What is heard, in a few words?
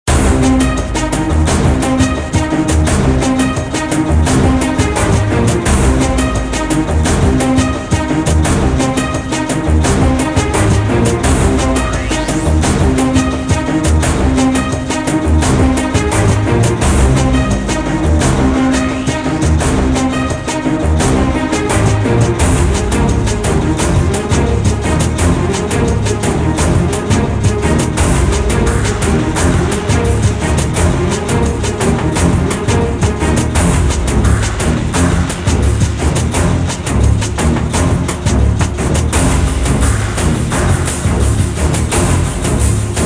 music race loop